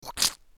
Splat; Assemblee SFX

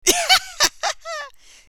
laughter; Assemblee SFX

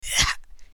hiss; Assemblee SFX

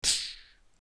hit; Assemblee SFX

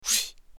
Woosh; Assemblee SFX

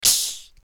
crack; Assemblee SFX

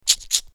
Reload; Assemblee SFX

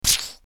Shot; Assemblee SFX

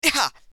Pain; Assemblee SFX

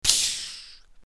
Shot; Assemblee SFX

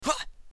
Hyah; Assemblee SFX

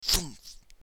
Thunk; Assemblee SFX

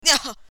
Pain; Assemblee SFX

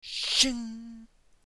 Shing; Assemblee SFX

